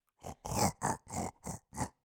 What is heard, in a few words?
say, pig; minecraft; mob; SMCNV-pack